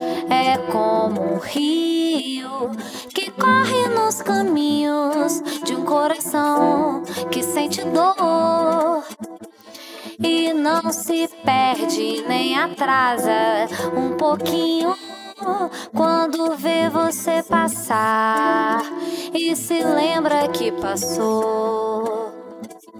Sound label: vocals; SiSEC11